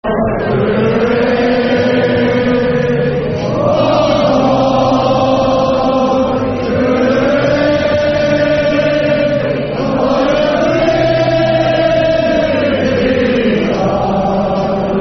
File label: Football Chant (Soccer Songs)